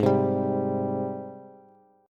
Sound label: Ab Major 7th Ab down Bass; AbM7 strummed; JGuitar